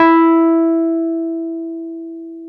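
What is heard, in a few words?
Wurlitzer electric piano E4; Keyboards of The 60's and 70's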